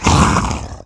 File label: pain